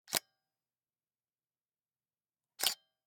Camera, Pentax KS2, DSLR, electronic, mechanical, snapshot, exposure hold, release; Eiravaein Works; Vaeyan IV